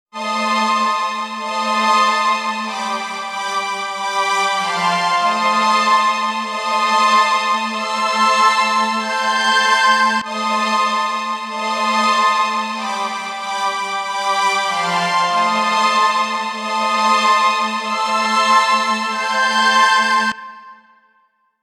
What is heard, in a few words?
Behringer X32 Rich Plate